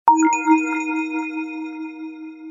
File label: HTC alert